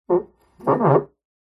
household cup mug turn rotate scrape on wood table